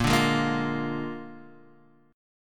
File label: Bb 7th Bb Guitar Half Step Down, chord